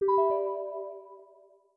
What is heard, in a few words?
Windows Notify Messaging; Windows 8 (Windows) (gamerip) (2012)